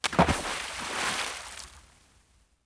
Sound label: splash small Object; water splashes